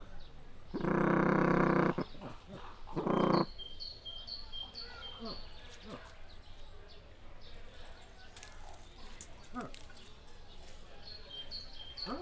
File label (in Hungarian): mindketten melyhangis, feher fulesfacan; miskolc zoo